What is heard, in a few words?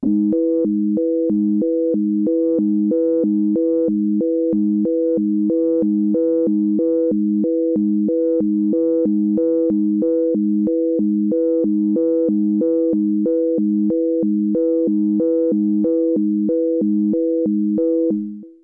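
SEQUENCE DX21 Crystals; Crystalz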